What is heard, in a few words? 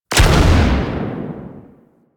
Ship Primary Cannon Fire; Weapons; Warframe; Railjack